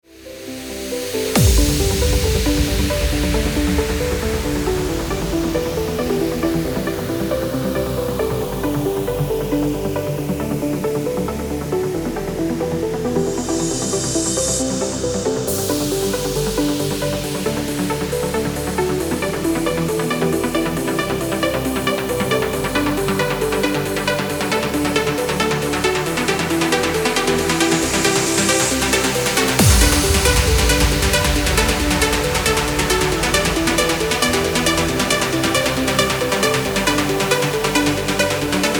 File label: Instrumental Mix; 90s